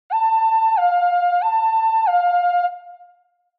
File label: Ambulance Siren Close